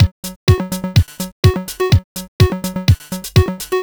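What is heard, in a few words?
Electropops No snare, Drum Loops 125bpm; Nu Dance Electro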